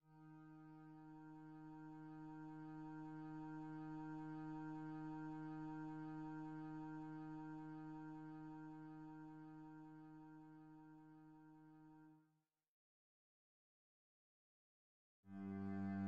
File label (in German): sehr langsam bass, string bass